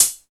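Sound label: HAT, right; East West - Phat & Phunky CD2; 90's Sample CDs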